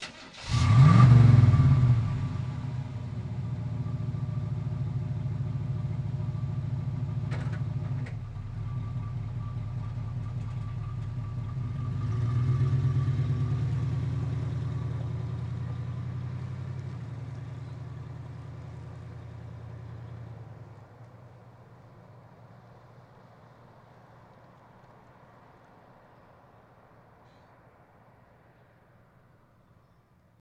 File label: Blazer Strt Awy Slow, chevy blazer